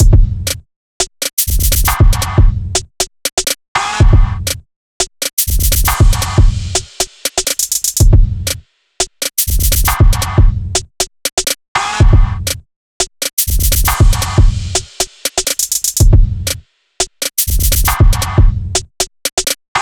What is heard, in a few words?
hip hop danz, grooves